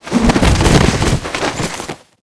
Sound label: Knockback Roll